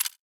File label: cursor